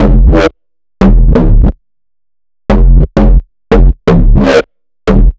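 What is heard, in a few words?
bass synth loop saturate, 89, F minor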